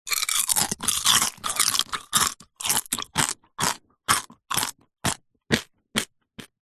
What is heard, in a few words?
human eating crunching cereal cornflake; zapsplat